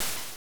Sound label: Open Hi-Hat; Shinobi III; M8; Genesis